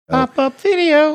singing pop up video

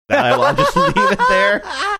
cute laugh

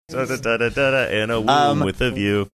singing series of notes

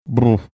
making mouth lip sound

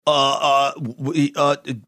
vocalizing being shocked

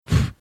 blowing into microphone

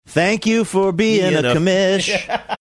singing thank you for being the commish

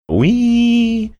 singing drawn out we

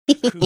evil giggle

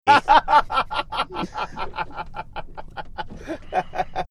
having good laugh

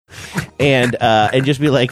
snickering

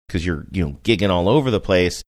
giggling all over the place

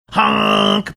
honk

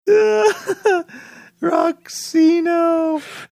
laughing saying rocksino

